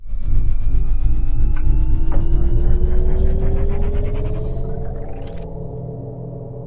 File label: gate power up; space-exploration 0.5.80